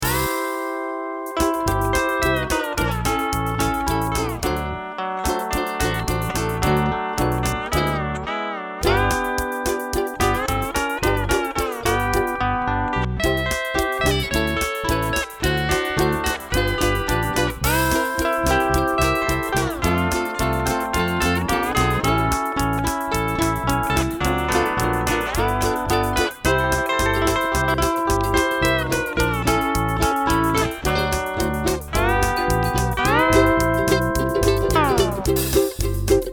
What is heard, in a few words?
Steel Uke inst